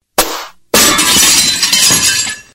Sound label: Glass Breaker